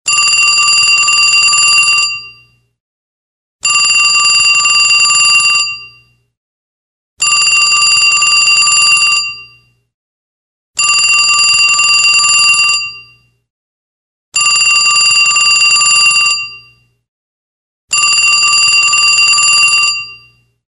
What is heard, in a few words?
Old Phone Ring; old phones